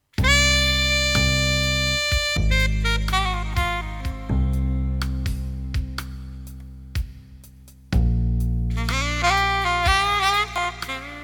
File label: SAX